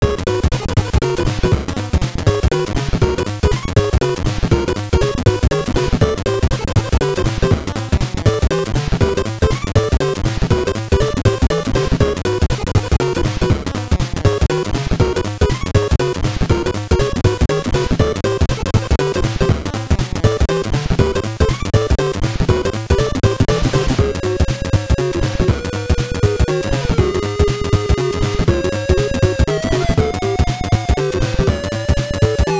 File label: Boss Fight; New Ghostbusters II; NES